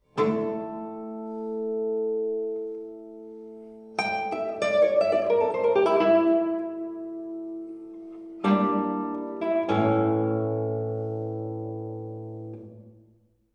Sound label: fig8 guitar processed; NCEM